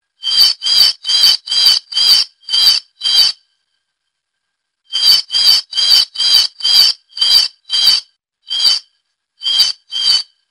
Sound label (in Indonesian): burung asing